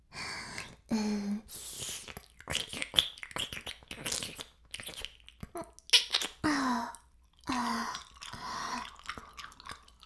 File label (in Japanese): 耳舐め音声, ASMR; 白くて黒い魔性円環